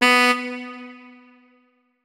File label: notes, saxophone; Hideout Furniture